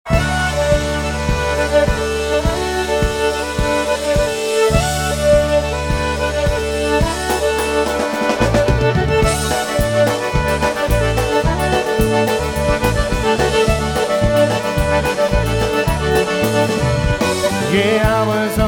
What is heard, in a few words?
Polka, Live Jam